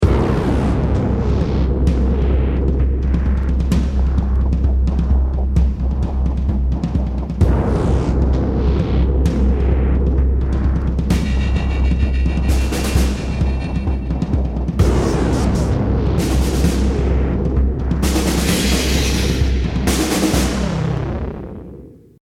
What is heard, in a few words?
choose team